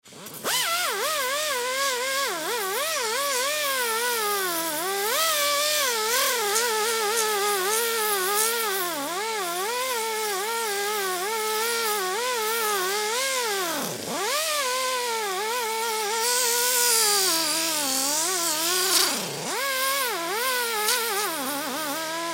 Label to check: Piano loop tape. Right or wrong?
Wrong